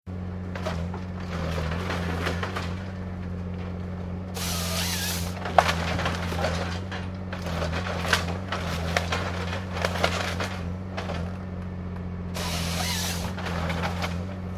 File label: Industrial Forestry Tree Harvester Splitting Wood Cutting Up Blocks Engine Chainsaw